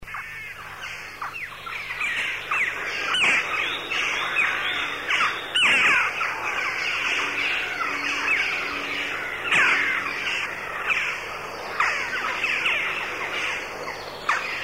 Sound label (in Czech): kavka